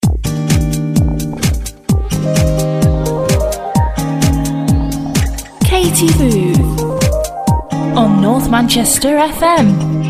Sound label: show jingle